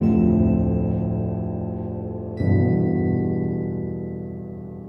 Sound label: keys soft, Sad Loops